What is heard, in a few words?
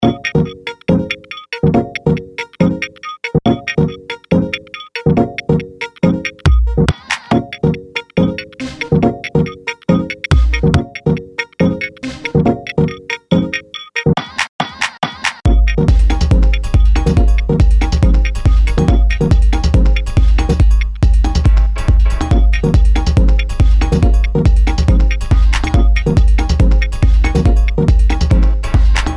electronica